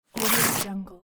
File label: tearing a piece of paper